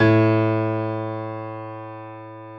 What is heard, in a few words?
piano A1; AKAI S-Series CD-ROM Sound Library VOL-8